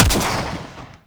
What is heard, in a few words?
Swarm Missile Shot; Enemies; Warframe; Eidolon; Jetpack Marine